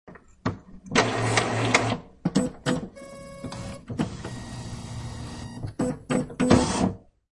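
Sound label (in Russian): распечатать копию в помещении офисная техника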